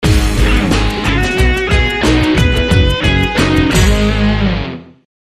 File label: Sting; Psyching Up